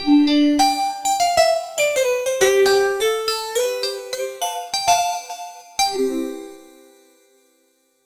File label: zills instrument; musical instruments